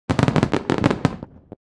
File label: Fireworks display explosions short